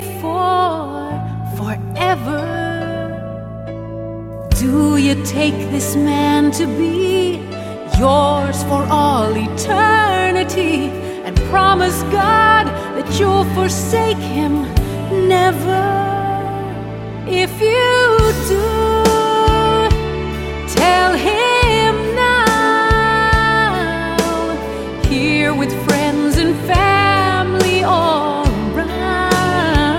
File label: Duet